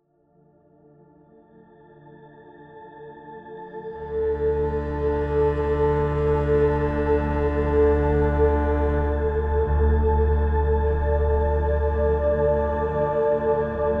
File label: fear verb